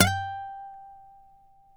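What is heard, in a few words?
nylon, FLAMENCO, Guitars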